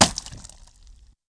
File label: flesh, violence; Benny Placeholders